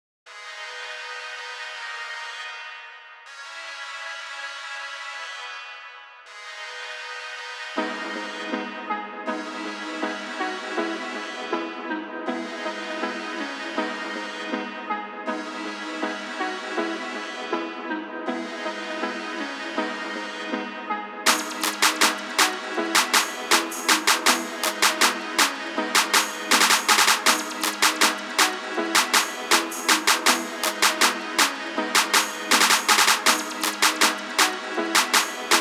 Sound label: Modern Hip Hop